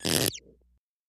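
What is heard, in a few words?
Creaky Squeak Small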